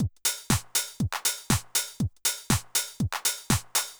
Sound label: House Drum